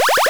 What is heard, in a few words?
SOUND BONUS